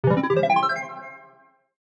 slot start

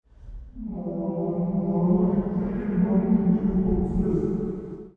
spirits

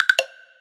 wood blocks

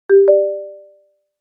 incoming Notification

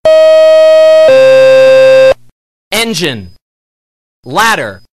fdny engine ladder

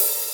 hihat open, drums; standard

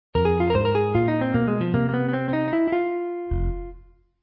win music; pvz

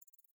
Hover out

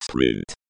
Sprint Robotic

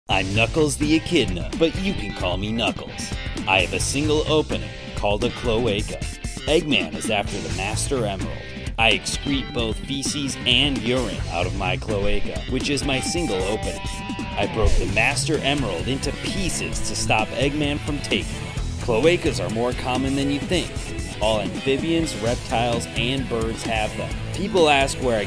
music

